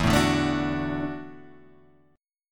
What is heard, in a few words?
Gb Minor 7th Sharp 5th, Guitar